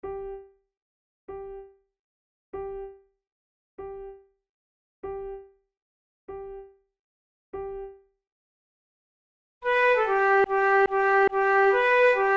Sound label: duet, flute tunes; Oh it is not while riches